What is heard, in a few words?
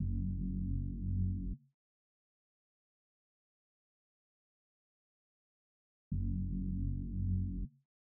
157 BPM F# Min Bass; Melodies; Loop Stems; Cymatics; Pharaoh Premium Drum Samples (BETA); Valley of the Kings